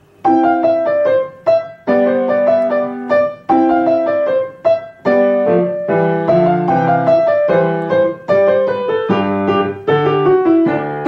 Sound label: andante; wilton charles henry